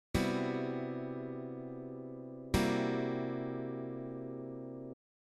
CMaj7 sharp 11 C7 sharp 11; Chord Extensions

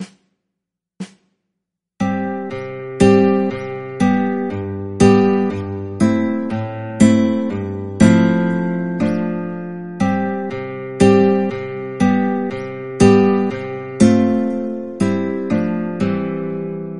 chord progressions I V vi IV

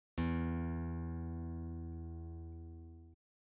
Tuning E, guitar

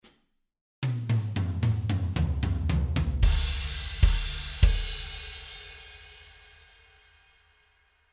Slow Blues Ending in G Drums

